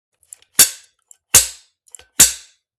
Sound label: crimper